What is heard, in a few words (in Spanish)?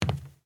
Paso zapato madera